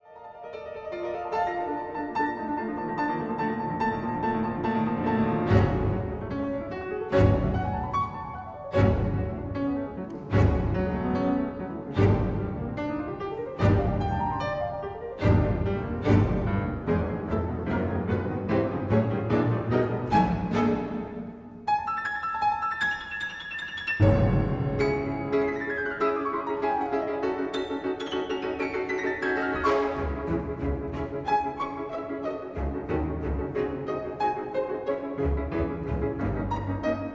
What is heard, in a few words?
Concerto for 2 Pianos D minor Allegro; Poulenc